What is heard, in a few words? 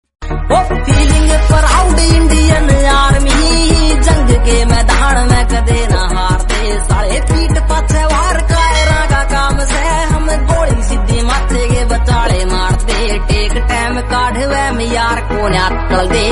indian army ringtone